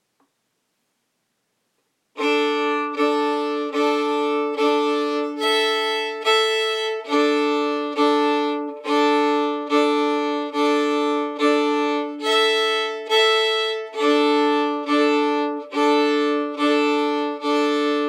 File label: Wildwood Flower Chords